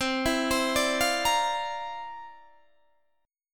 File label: C 11th, C, down, Guitar, DADGAD